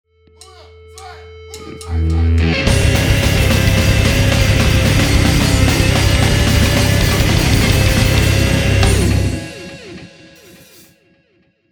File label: karaoke version